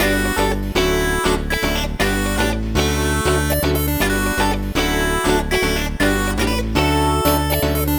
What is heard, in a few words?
Backing, 120bpm D, Nu Disco; Zero-G; Happy People